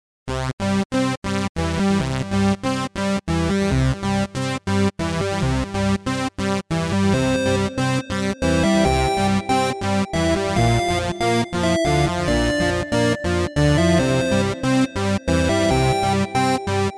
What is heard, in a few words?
Tumbly Space Flutes